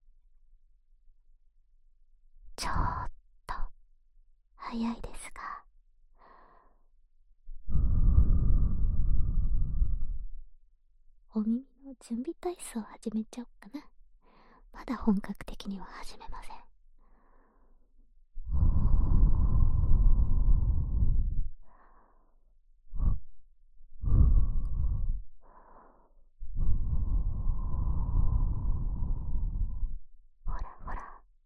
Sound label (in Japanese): ねっとり 両耳舐め 乳首弄り, ASMR